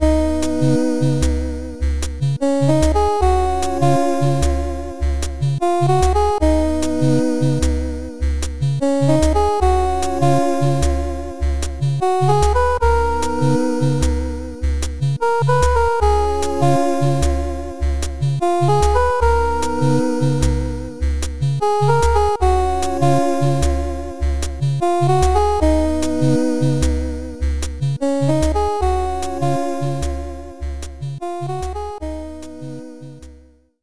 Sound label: game over; potential vintage hero